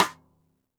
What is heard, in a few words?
xstick snares on; JazzFunkKit